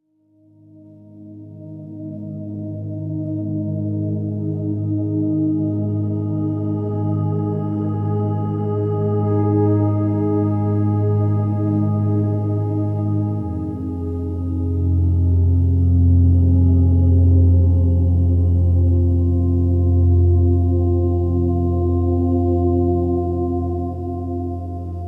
Floating Over Quiet Oceans, pure ambient for sleep